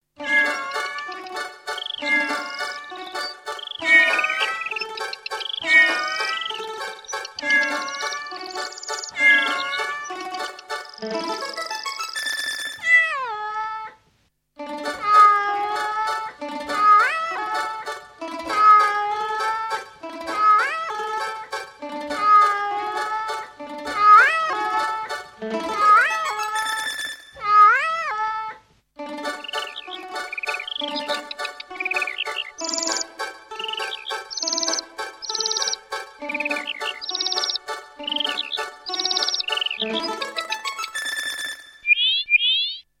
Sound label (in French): chat oiseau, violoncelliste